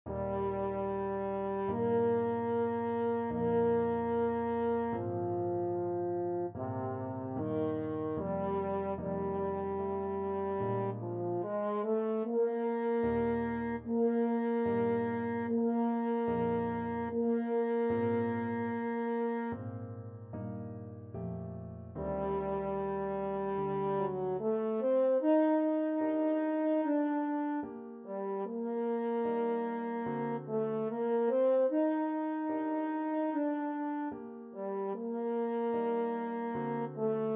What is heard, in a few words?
Consolation No.1 in E for French Horn; Liszt, Franz